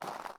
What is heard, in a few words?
mud walk, step